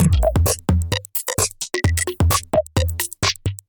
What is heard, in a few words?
Techno